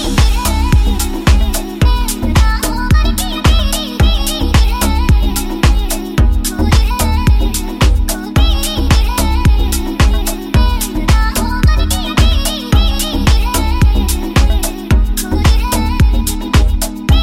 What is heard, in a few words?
Song Music Ringtone